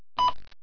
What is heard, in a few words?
beep